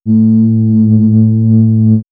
Swirls, pads; X-Static Goldmine

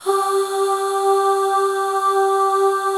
G3 FEM AHS, FEMALE AHS; Northstar - New Gold VOL-1

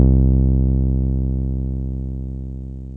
TB303 TRI, ROLAND TB-303 BASS

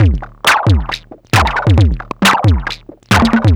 Drums Strange

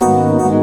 PAD HIT, Synth FX Misc

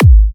G#, kicks; markus hakala kicks

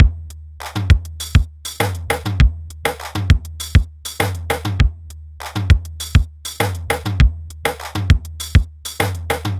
Loops, 707; classic machines; 707mod

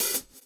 groove; AMG Gota Yashiki Groove Activator

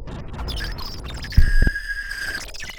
Glitches

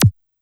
F#1 Hi Q, Dance Kit; Yamaha PSR-E323; drum machine

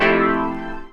CHORDS; EastWest; Dance Mega Hip Hop Electro

